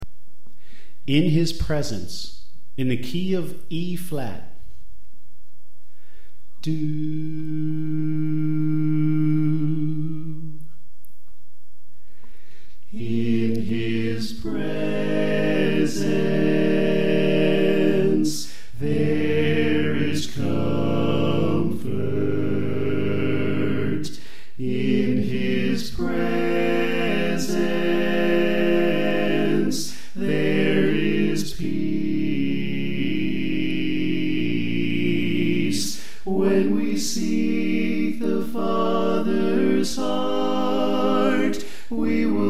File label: Bass Left